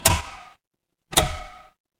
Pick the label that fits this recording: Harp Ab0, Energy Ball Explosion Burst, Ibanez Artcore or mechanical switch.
mechanical switch